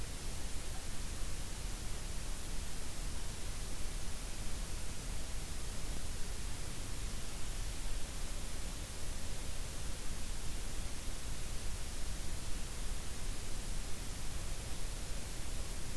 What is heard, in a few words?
WFR audio emfisis